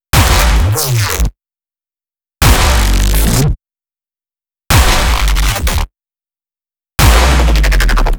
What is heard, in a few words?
Crushbass